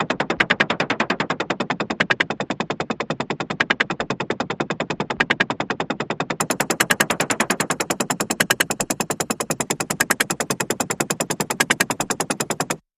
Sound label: Jittery Nerves